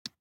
DICE on Neoprene, Grab, Standard, 2 Two Dice; Samples; ArticulatedSounds; DICE Sfx Party (Extended)

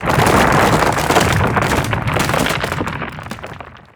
Spikes Wood Emerge; SFX Kit; Foley - Misc

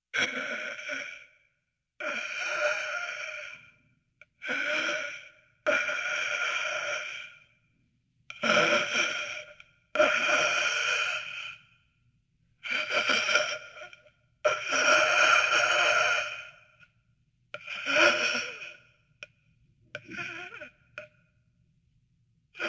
Dying Breaths; Halloween Havoc - Sound Effects; Ktel

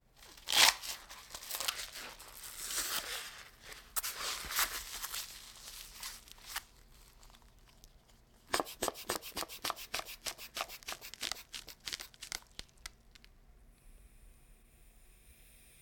Hospital effects

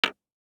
DICE on Hard Wood, Drop, Standard, 1 One Die; Samples; ArticulatedSounds; DICE Sfx Party (Extended)

